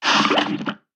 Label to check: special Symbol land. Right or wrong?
Wrong